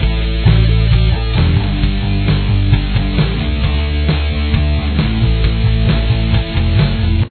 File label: chorus, guitar